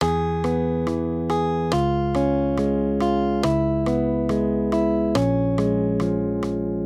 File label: maj m3, Major Triads, Root Movement Three Semitones, Guitar, Accompaniment; E-Db